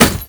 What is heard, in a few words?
Hit